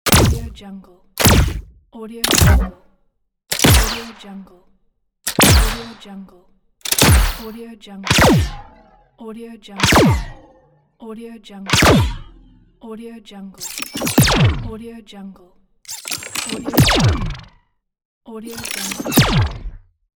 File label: blaster shooting pack